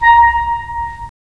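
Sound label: wind clarinet; Roland - LA Composer vol. 3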